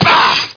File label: BLOCK; SOMC Family Forum